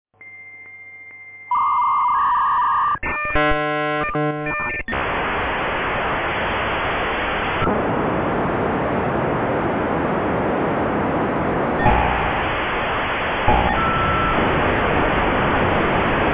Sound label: v90 texas instruments, modems